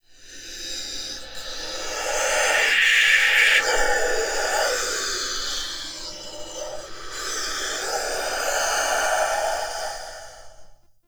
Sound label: Binaural Whispers; Ambience; Warframe; Shadowgrapher